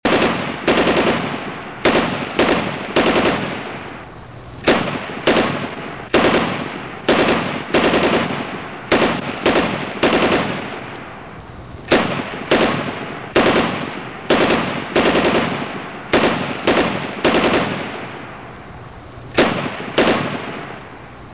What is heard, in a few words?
Maschinengewehr Machine gun